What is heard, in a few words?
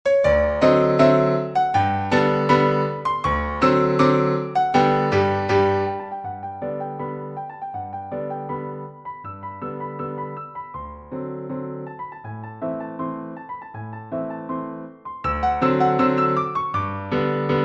polka, piano; Wide Awake